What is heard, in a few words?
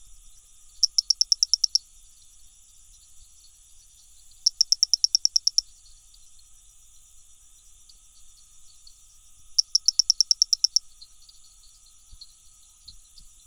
Dendropsophus sanborni, cantos; herpetologia